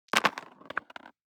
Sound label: DICE on Cardboard, Throw and Roll, Standard, 6 Six Dice; Samples; ArticulatedSounds; DICE Sfx Party (Extended)